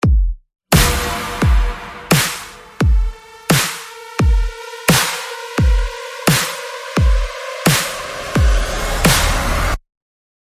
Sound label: Beat Drop, Percussion and Effects